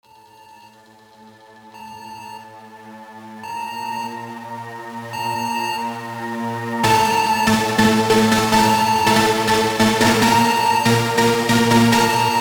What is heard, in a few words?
Sped Up superbass reverb slow